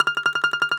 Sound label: DOMRA, Russian Strings